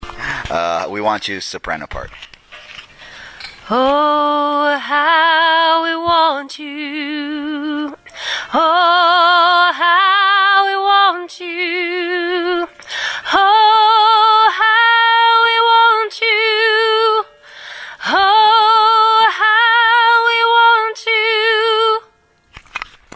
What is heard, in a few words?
soprano